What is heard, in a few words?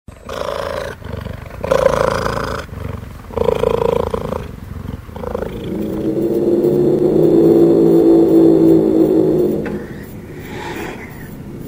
demonic growls; fiat light show vatican 2015